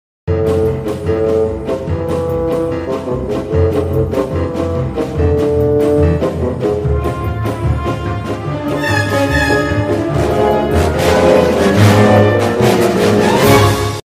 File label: dramatic tone